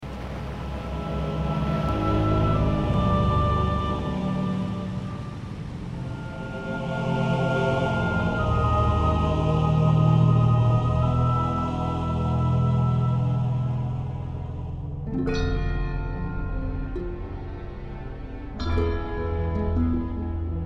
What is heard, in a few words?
Harmonies of the Deep